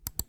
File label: Mouse click; Phasmophobia